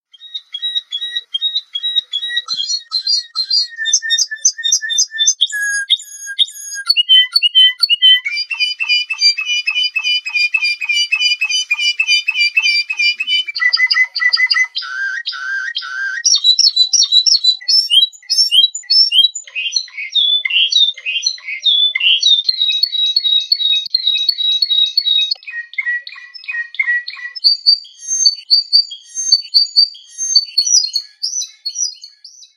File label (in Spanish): Nasalidad, timbrado español; Αρνητικές νότες του τιμπράδο